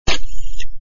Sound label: open